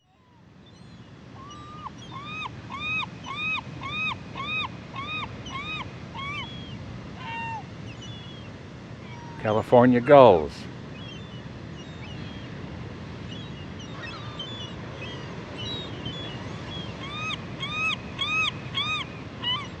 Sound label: California Gull; Western North America; Dan Gibson; Wildlife Identification By Sound